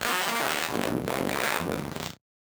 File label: Casio, Glitched, malfunctioning synth